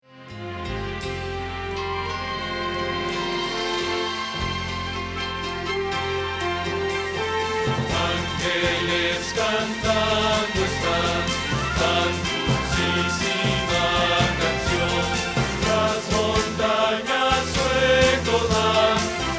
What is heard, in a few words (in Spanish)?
Bajo